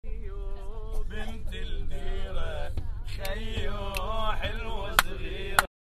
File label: loop